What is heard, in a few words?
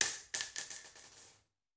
Bullet Shell; Sound Ideas; Guns